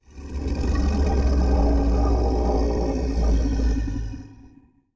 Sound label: Large Creature Long, Monsters; Fantasy Sounds Bundle; Large Creature 13